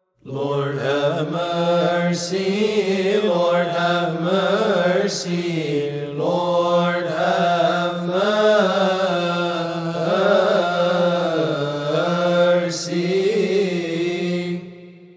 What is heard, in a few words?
Lord Have Mercy 3x Seasonal Litany